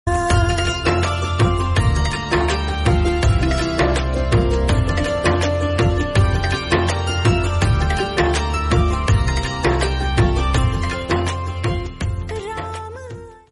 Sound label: Instrumental Bgm